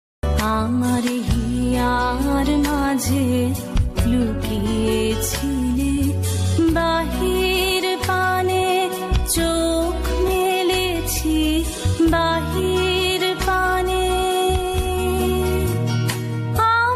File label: rabindra sangeet bengali ringtone, sad ringtone; আমর হযর মঝ লকয ছল